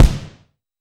Boss DR880 kick; drums

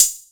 Roland TR-606 Hat

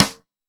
Boss DR880 stick; drums

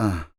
perc voice, Roland MC-909

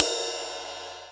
Ride Cym; EMU Planet-Phatt

